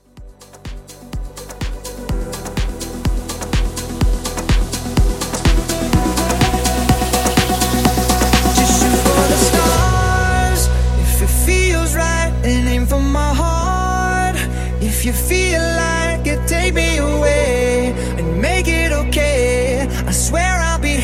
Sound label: Mashup 125